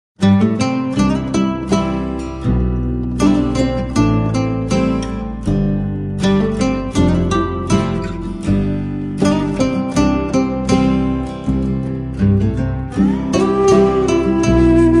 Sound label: Theme - Instrumental; Tamil; Aarohanam; Ode To Motherhood